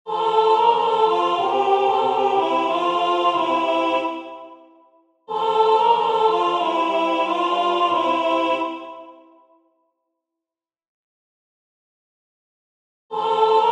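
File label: (Ao Vivo); A.Dvorak; Dyby byla Kosa nabrosena; Alt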